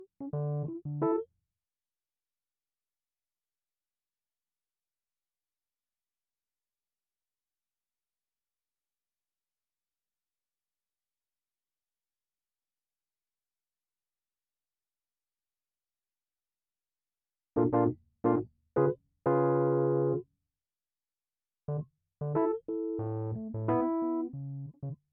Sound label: Keyz R